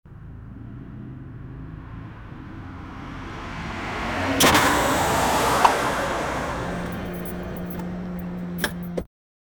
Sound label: car crashes